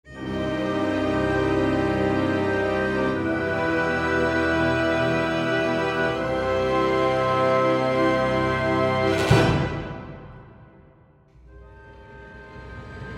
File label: Tutti Texture